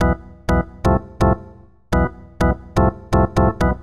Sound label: Organ chops (125BPM), retro house